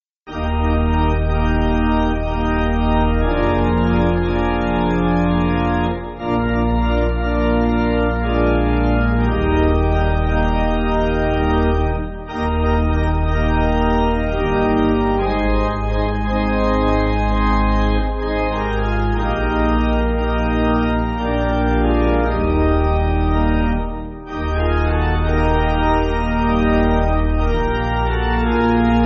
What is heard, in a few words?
Living For Jesus, pipe organ; Charles F. Weigle; Eb